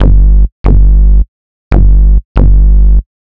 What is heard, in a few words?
ELECTRO BASS 140 A